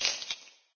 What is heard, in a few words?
Coins